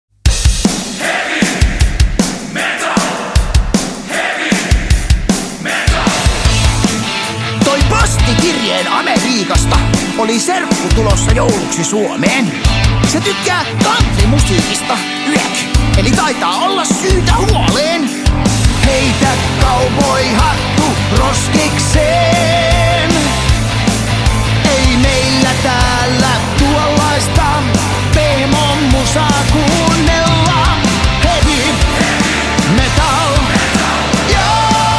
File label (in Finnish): heavy metal joulu